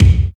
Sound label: KICK; East West - Phat & Phunky